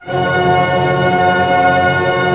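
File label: tremolo C, orchestra; Sound On Sound; Peter Siedleczek's Orchestra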